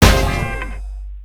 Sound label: HIT, Hip Hop Misc Perc; E-MU Producer Series Vol. 8 – Platinum Phatt